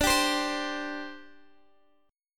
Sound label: D 7th Suspended 4th D Guitar Open G